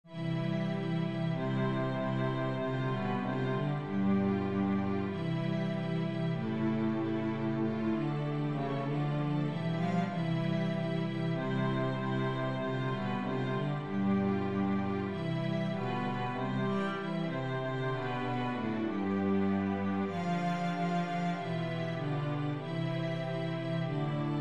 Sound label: andante, cello; rosamunde